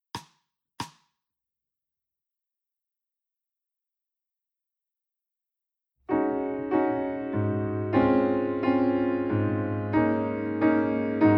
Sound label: Accompaniment Bb; instrumental solos; Level 3